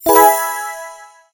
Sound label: auto